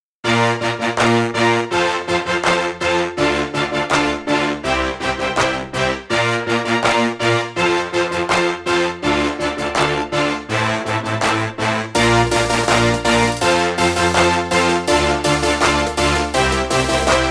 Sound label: Upper PS Choir backing track